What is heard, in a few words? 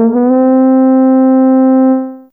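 Trumpet Rise C3, Fairlight CMI IIe; Brass; Brass2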